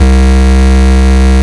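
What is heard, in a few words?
bass distortion C2; LIMAT LSDJ kit; LSDJ Extra Bass Notes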